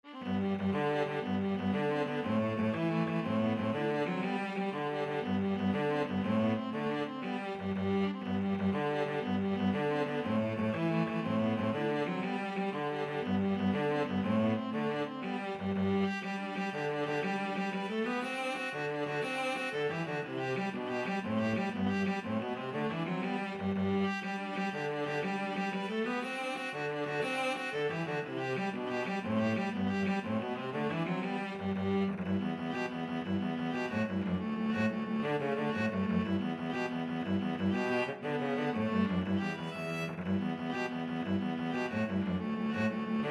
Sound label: irish jig medley, viola cello duet